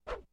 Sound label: Small Swishes Light